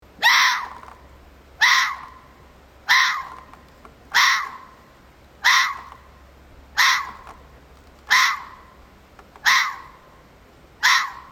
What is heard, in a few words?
fox calling